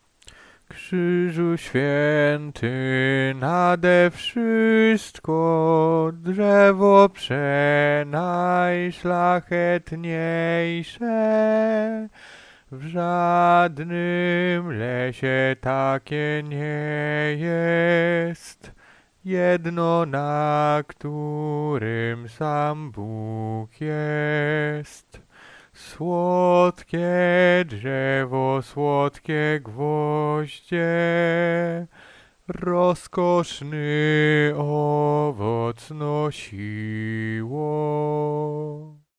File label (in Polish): bas; Krzyzu Swiety; Wielki Czwartek; spiew na triduum